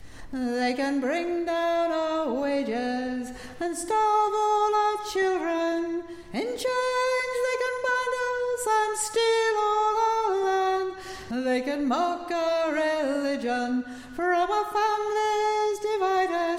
Chorus

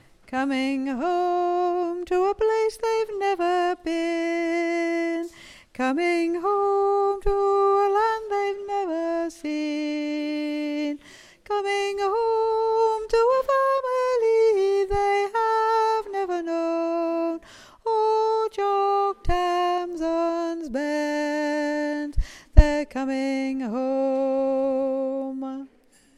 Chorus

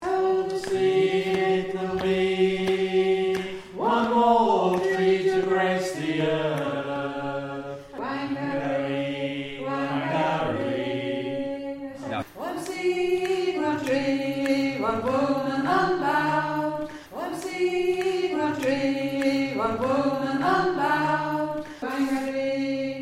Alto

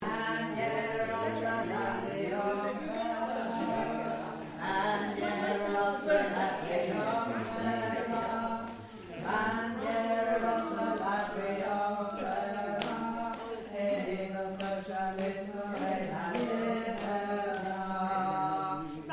Tenor

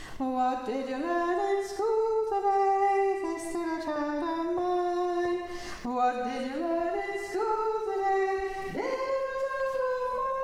Tenor

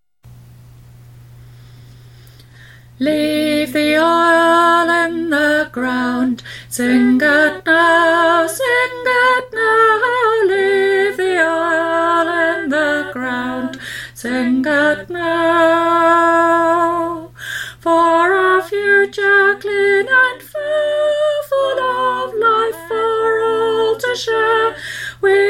Sop Tune

